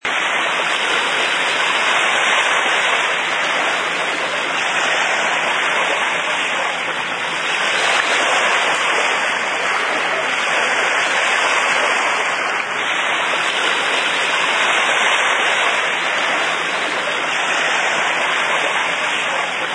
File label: Sea Smooth, Constant sound of smooth calm ocean waves; Ocean (Sound Effects:Nature)